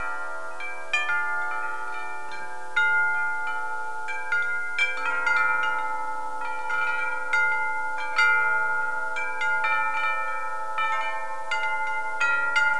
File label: Windchimes